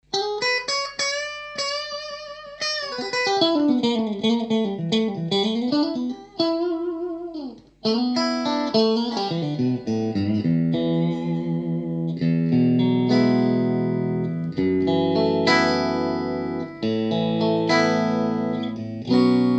clean middle bridge, Strat; schwarzbach; VP-Strat-66

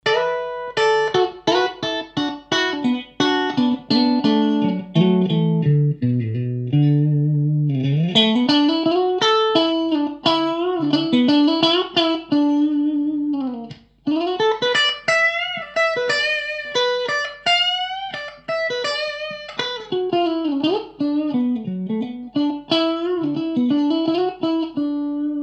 clean middle bridge; schwarzbach

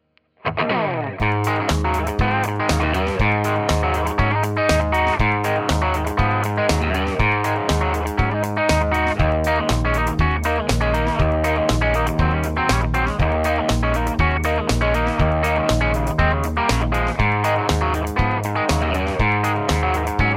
crunch bridge; schwarzbach; MudCat

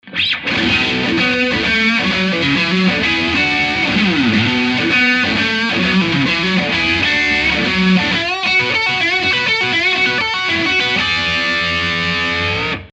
brett middle, Strat; schwarzbach; VP-Strat-56

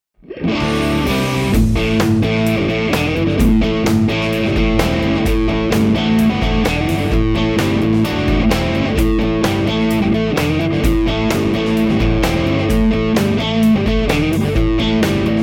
Dist Mid Bridge, Strat; schwarzbach; VP-Strat-IrishTour

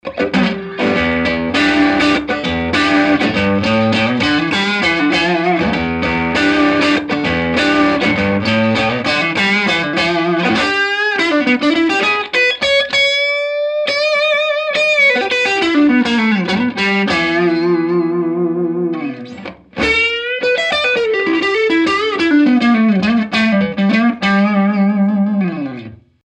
crunch neck, Strat; schwarzbach; VP-Strat-56

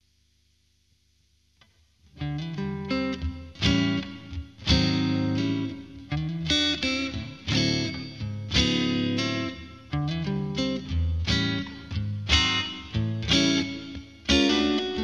Neck Twin Clean

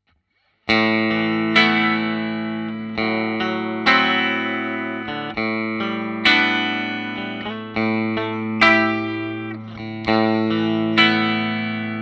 Clean Bridge, Strat; schwarzbach; VP-Strat-IrishTour

